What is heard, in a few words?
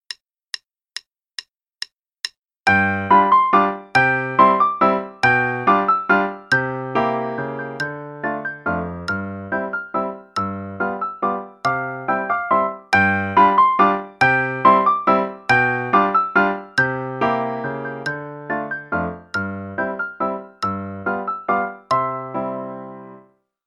Waltz (both parts); Faber Adult Accerlated; Adult Accel Level 1